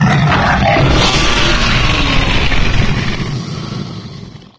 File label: antlion guard die; npc; Garry's Mod; hl2